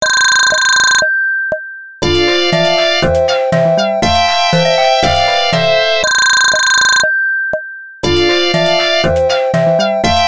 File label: Ring Bop, ringtones; Mobileer; ME1000